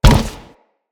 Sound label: Step Metal, Footsteps; Grineer